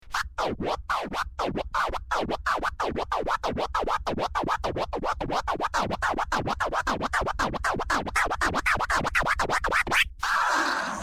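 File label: flares, scratch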